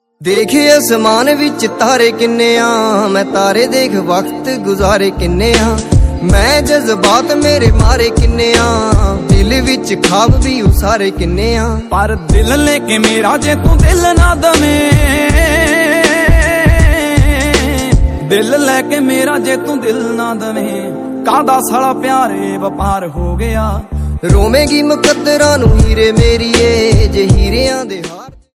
punjabi song ringtone

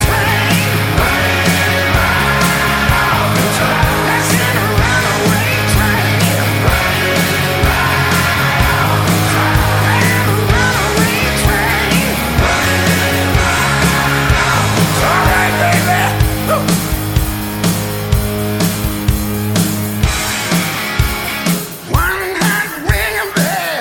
rock

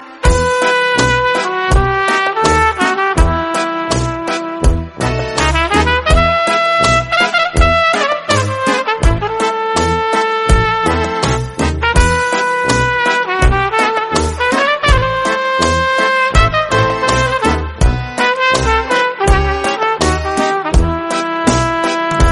romanian trumpet; ringtone

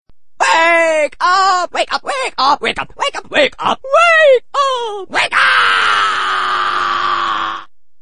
wake up ringtone

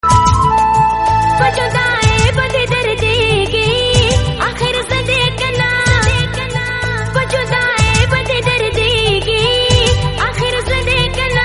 pashto song

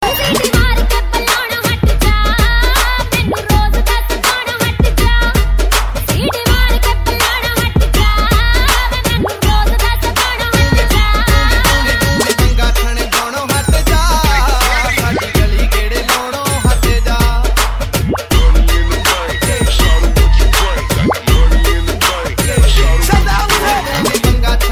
seeti punjabi ringtone

